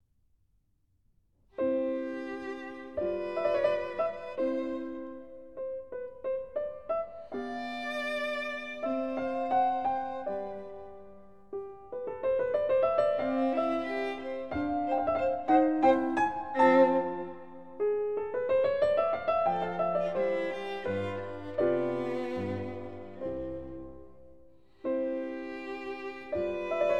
Violin Sonata Andante & Allegretto in C, Andante; Mozart; KV 404 385d

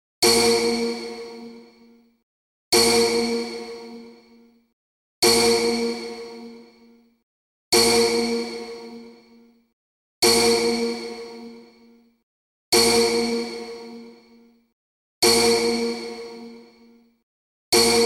Mono Test; AK240

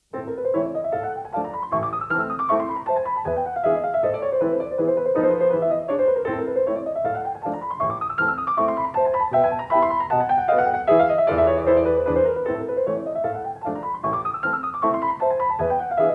Etudes, op.10 - No.2 in A minor, Piano; Chopin; Claudio Arrau

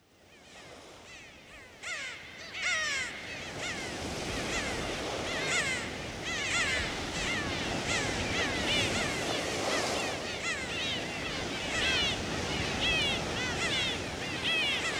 New Age

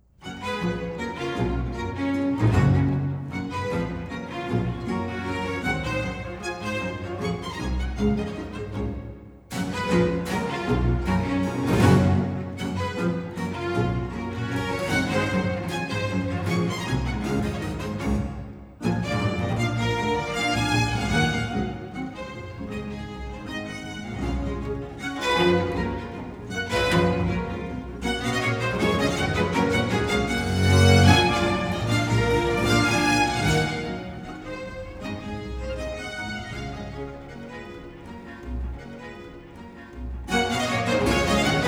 Sinfonia, Allegro; Antonio Vivaldi (1678 - 1741); Vivaldi - La verita in cimento (2003)

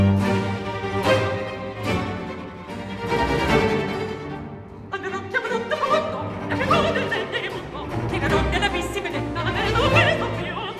Aria; Scena Ultima; Alcina; Andero, chiamero dal profondo; Antonio Vivaldi (1678 - 1741); Vivaldi - Orlando furioso (2004); Atto III

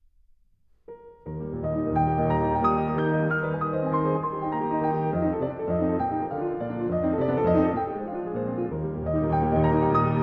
Prelude op.28 no.19 in E flat major, Piano; Chopin; Preludes

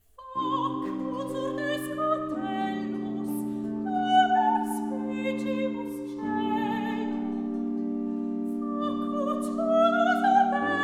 Recitativo; Antonio Vivaldi (1678 - 1741); Vivaldi - Mottetti (2003)

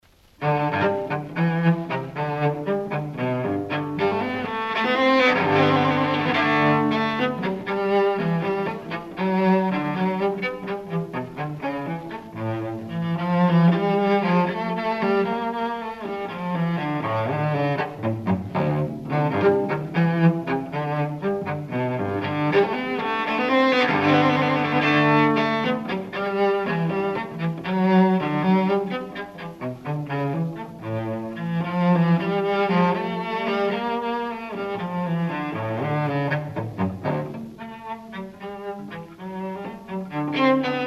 Unaccompanied Cello Suite No.1 Gigue; Bach

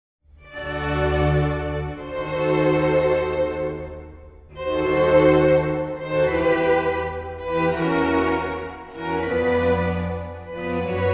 Oboe Concerto, Adagio e staccato; Albinoni

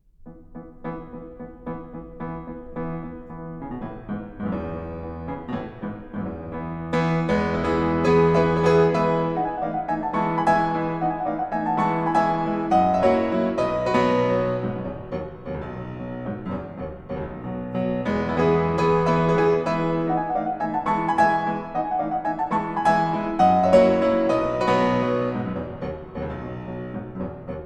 Mazurka in E, Chopin - Piano Works

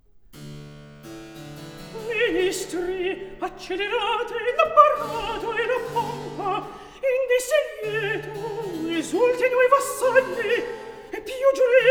Recitativo; Atto III - Scena 8; Griselda; Ministri, accelerate

